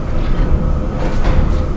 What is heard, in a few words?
mech amb; dm achluophobia